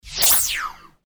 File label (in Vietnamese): Am thanh vien dan nho bay qua rat nhanh